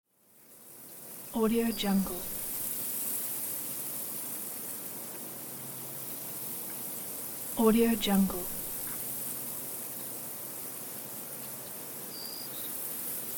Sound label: field ambience